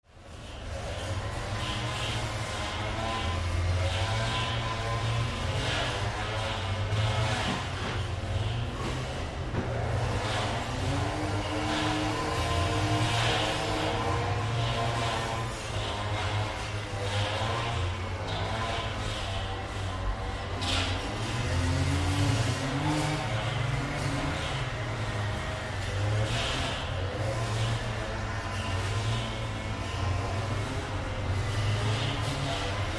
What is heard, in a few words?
Suburban ambience distant work site